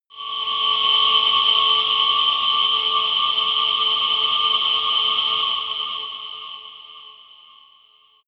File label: sci-fi quiet planet, drones